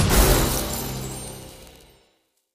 win line end flash medium win; jumanji

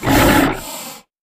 win Rhino; jumanji

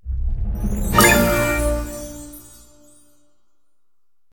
candy Win Reveal Medium; fairyhansel

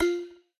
button click; whos the bride

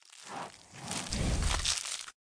Goat; sym Anim; jack and beanstalk

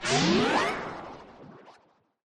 wild Move; east sea dragon king

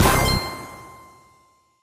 big win end; flowers

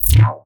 chest Red To Blue; reef raider

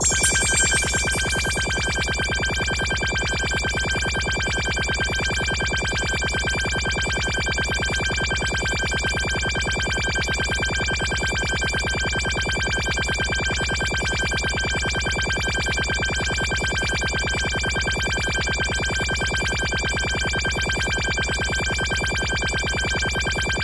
big Win Loop; magic maid cafe

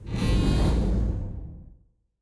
expanding Wild Animation; the wolfs night

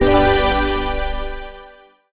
win medium drop; dazzle

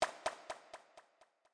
continue button click; east sea dragon king

